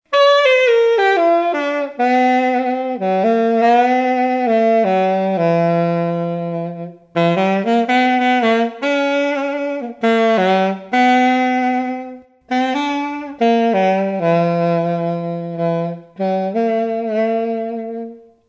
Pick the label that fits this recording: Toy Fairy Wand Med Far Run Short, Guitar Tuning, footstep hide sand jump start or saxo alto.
saxo alto